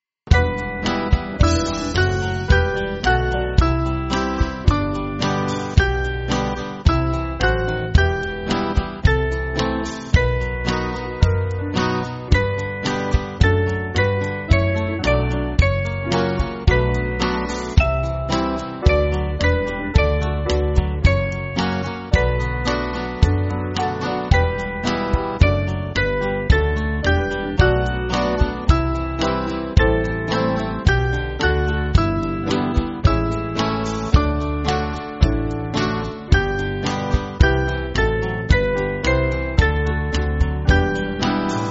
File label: Band, church music; Our Fathers Home; Au Fort De Ma Detresse